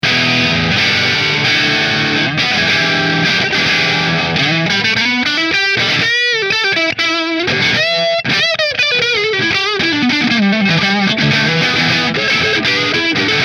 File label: Hamer Goldtop Neck Marshall